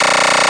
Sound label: c64 sid noize, Commodore 64 8bit SID Drums; LO FI